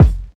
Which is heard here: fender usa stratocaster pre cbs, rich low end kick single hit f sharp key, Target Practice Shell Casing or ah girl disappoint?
rich low end kick single hit f sharp key